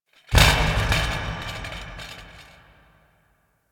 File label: Ceramic Rumble; Warframe; Ambience; Entrati